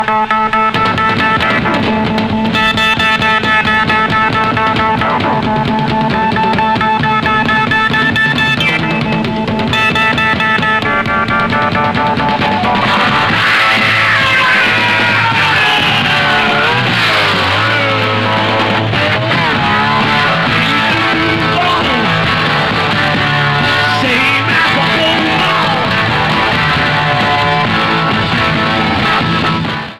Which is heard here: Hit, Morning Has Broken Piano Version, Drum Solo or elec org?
Drum Solo